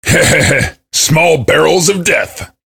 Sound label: cheer; svencoop; voice commands; SeriousSam